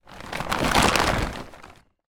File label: tarpaulin movement; foley; zapsplat